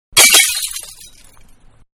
Medium Glass Bottle Break; Breaking; Bottle Glass, Medium-Breaking; Objects; Manhattan Production Music

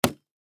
Machete Swing Hit Object Thud; Knife; Knives; Combat; Warfare; Blastwave

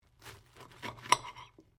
TOAST CUT; Various food preparation; Food (Sound Effects:Household)

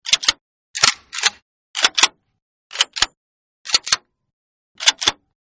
Shotgun Reload, Shotgun Reload-6 Takes; Shotguns

